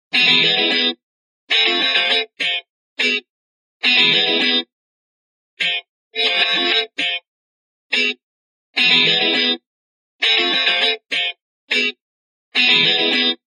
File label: Simple Sms